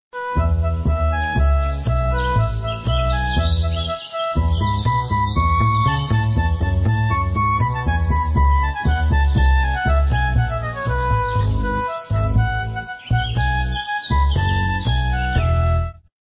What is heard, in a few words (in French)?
compere guilleri instrumental